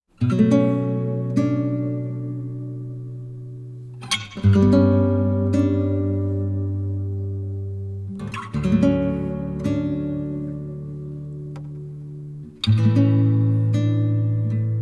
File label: Acoustic Guitar ver.; My Name; Korea